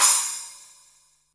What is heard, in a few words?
Boss DR-660 Crash, Drum Machines